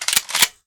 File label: shotgun cock; weapons